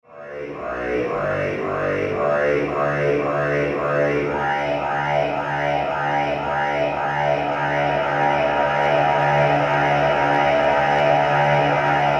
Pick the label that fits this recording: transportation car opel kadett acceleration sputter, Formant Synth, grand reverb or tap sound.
grand reverb